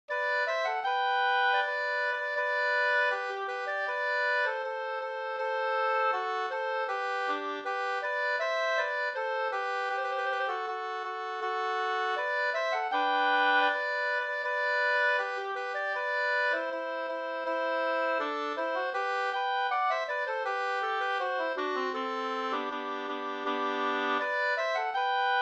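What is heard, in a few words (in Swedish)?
brudmarsch Gotlands brudmarsch 2 stämmor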